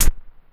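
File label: closed hi-hat FX, Drum & Bass, 160 BPM; Ueberschall; Drum & Bass Resonance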